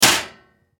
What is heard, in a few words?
hit metal